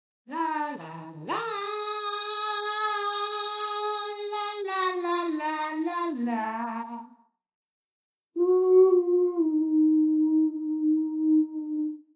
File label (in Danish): Acapella